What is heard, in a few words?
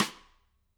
K2 room SdSt, drum